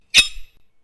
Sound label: sword hit; Wackelpeter